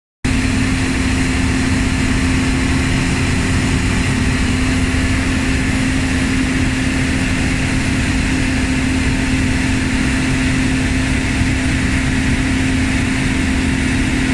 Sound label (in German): abtanken mähdrescher; Modulkoffer